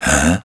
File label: humm; BattleSNDS